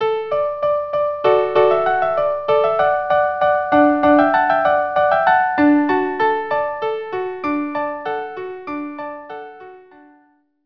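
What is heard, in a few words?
violin violin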